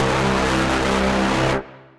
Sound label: Black Paint Bass; Ableton; Punch and Tilt